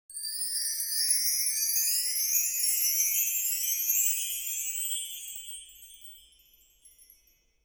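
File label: wind chime up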